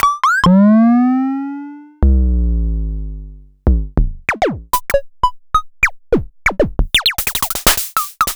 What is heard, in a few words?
Daytone Drum Synthe; Daytone Drum-Synthe-RDS